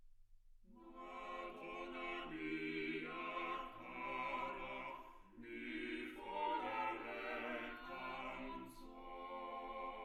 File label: quartet